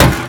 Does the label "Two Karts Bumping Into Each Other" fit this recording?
Yes